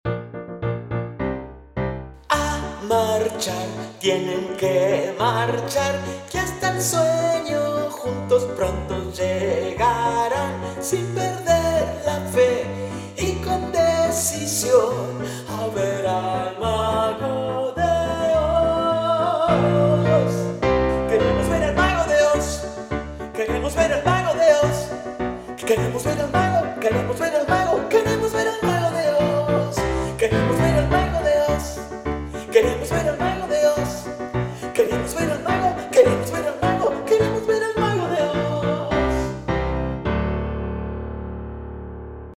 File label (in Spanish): REFE VOCAL; audición; piano solo; convocatoria a audiciones el mago de oz